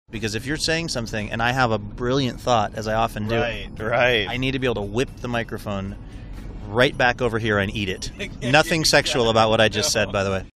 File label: whip microphone